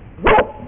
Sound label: ruff; sunrisechat